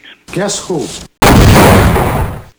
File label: exploding